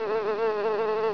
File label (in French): mouche cri, cris d animaux